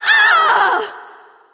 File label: Wound Crit; Creature; Oz